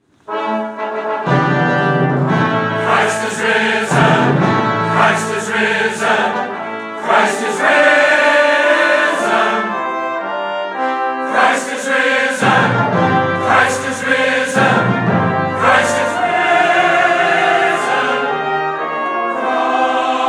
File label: Easter Fanfare